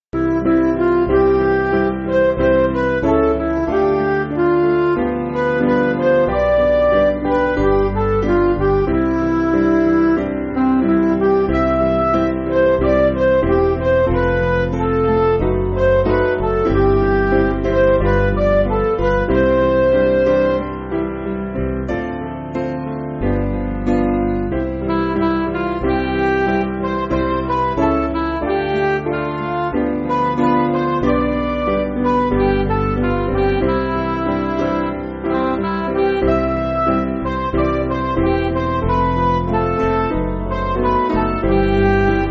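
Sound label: Midi, Music Backing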